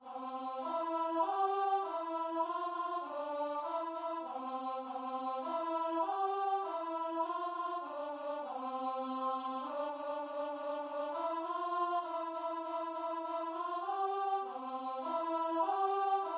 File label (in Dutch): een twee VOICE